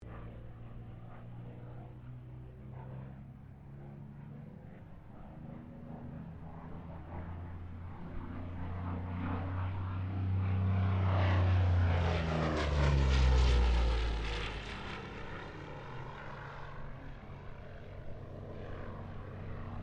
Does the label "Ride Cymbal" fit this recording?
No